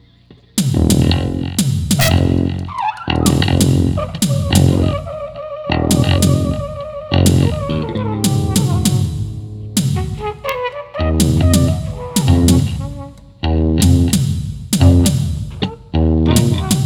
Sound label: funk